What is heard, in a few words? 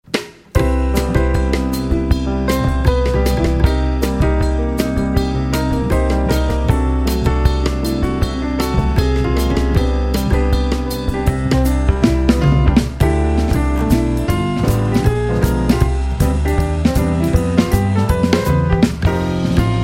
jazz trio